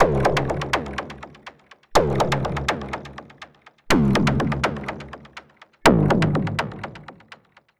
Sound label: 123 C Digital Ambience